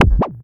Wobble, cartoon caper samples, Classic SFX; musicradar

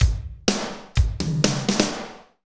Medium Tekno, Drumscapes Roland; Northstar; Med.Techno

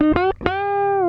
FUNKY, Grooves

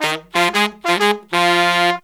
horns, 92 bpm; 90 s Sample CDs; Zero-G; Phantom Horns

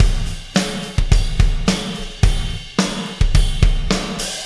Beat, Processed Drumkits

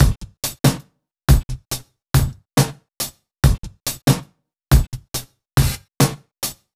SloMo Beats, Loops 140 BPM

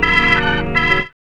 ORG RIF

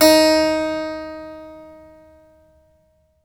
HARPSI D#4; HPSCHD 4

